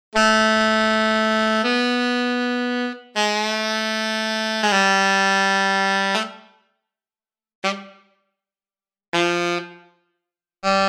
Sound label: Alto Sax